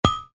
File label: Glass Clang